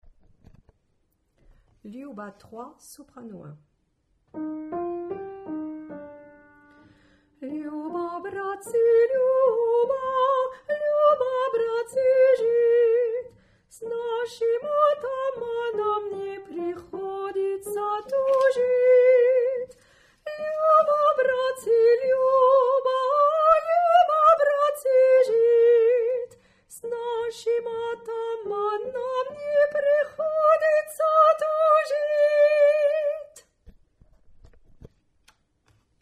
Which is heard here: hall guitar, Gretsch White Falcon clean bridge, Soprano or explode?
Soprano